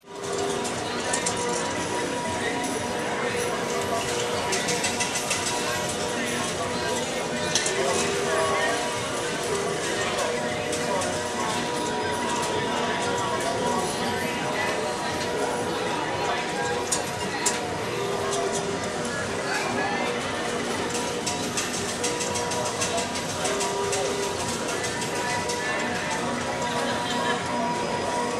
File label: Ambience, Casino, Ambience; 5.1